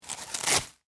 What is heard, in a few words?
Sphygmomanometer, Remove, From, Arm, Velcro, Blood, Pressure; Emergency; Hospital Sound Effects